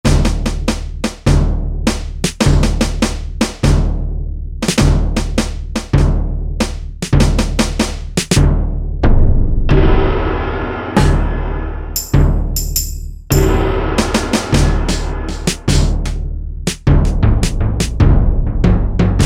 Orch Perc, E-mu Pro-Cussion; Factory ROM kits

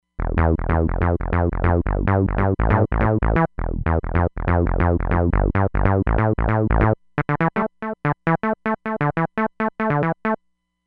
Acdg Bass, synth

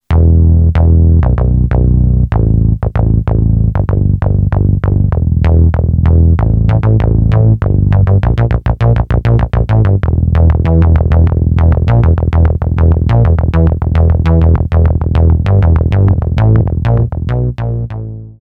Hi-Q Bass, Yamaha PSR-550; Voice

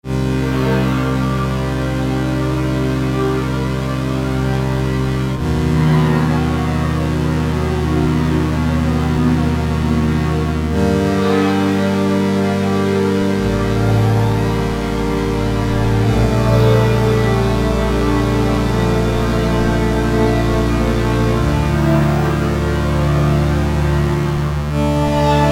Deep Padding, Granular; Green Oak Crystal

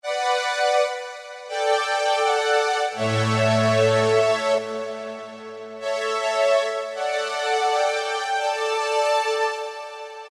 Chase String Ensmb, Roland PN-D50-01

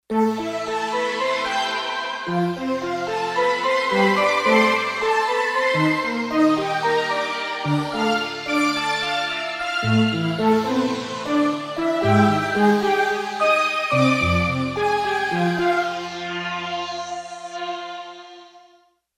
Attack Future

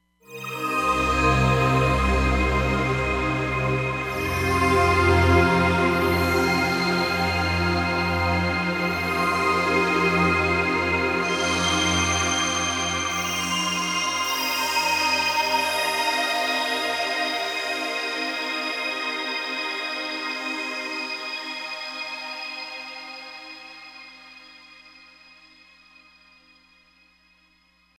Saturn Siren, Roland Fantom XR

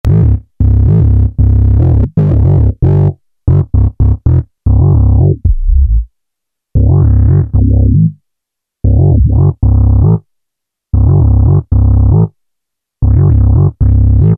Auto-Bass, Alesis airSynth

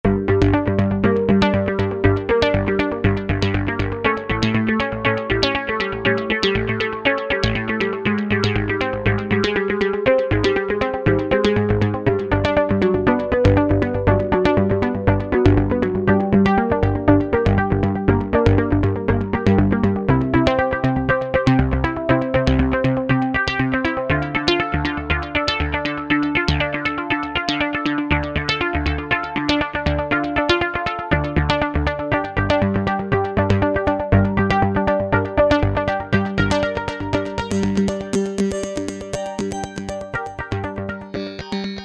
Radar Arp, Roland V-Synth; Factory Patches

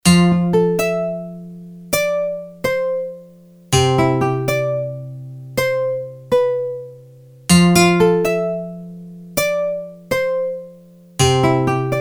Ping Pong Delay; t.c. electronics M300

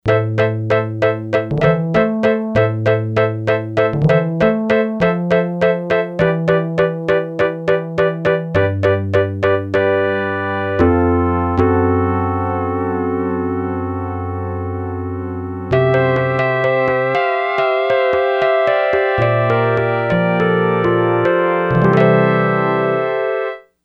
OldElectro, Yamaha DX100; Factory Patches

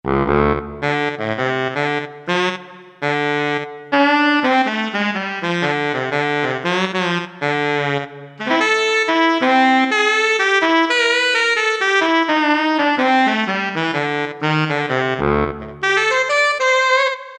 Saxophone, Roland U-220; Factory Patches

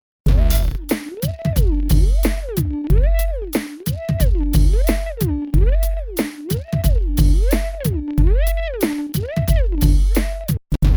Low

